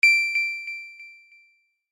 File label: Sweet Electronic Sms